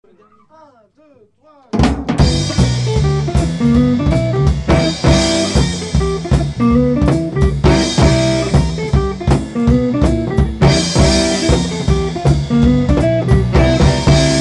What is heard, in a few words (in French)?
test fin sans batterie